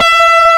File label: E4, GUITAR; AKAI S-Series CD-ROM Sound Library VOL-8